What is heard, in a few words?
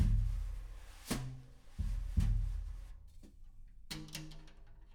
room A